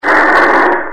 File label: Car Crash